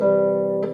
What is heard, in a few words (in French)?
Harpe Onde